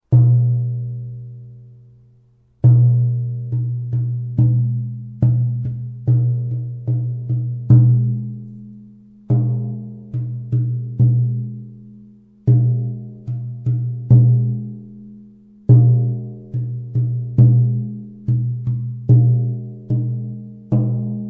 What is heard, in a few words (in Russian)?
buben, krym